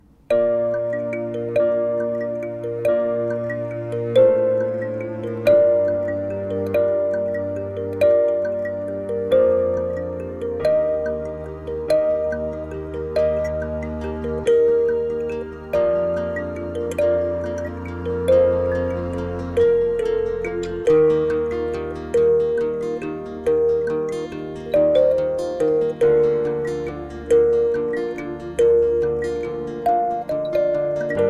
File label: kalimba cover